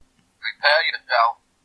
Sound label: Wiimote Sound